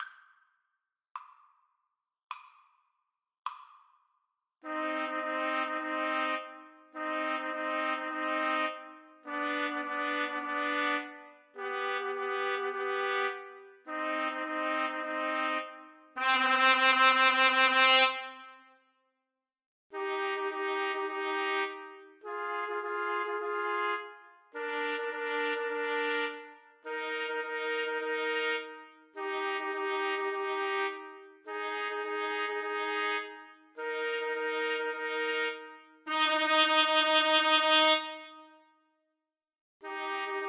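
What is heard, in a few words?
summer 2nd movement four seasons, Trumpet Trio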